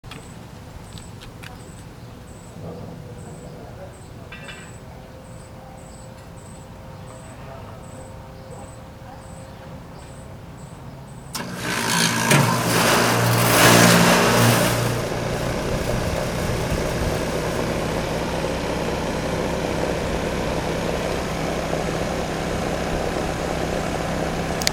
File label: Air Conditioner Noise